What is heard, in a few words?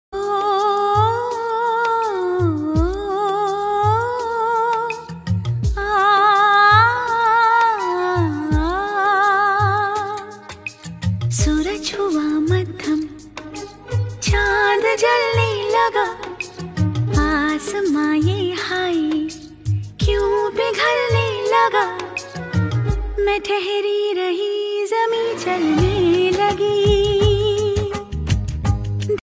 female hindi ringtone